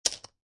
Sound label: Poker Chips Dropping On Poker Chips; Poker, gambling, chip: Poker chips dropping on poker chips, clicking; Lynne Music